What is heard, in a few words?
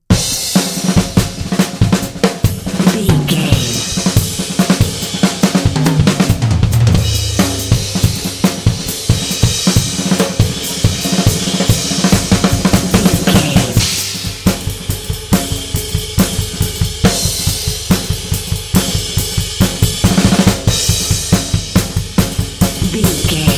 uptown swinger drums